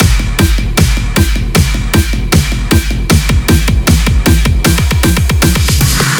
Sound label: fill loop 155 4 bars; GhostHack